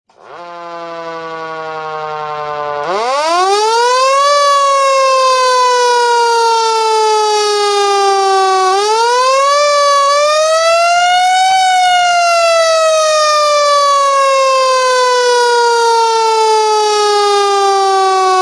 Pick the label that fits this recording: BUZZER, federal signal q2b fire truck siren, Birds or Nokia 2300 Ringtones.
federal signal q2b fire truck siren